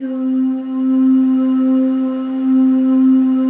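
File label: PAD, atmospheric pads; Best Service; XX Large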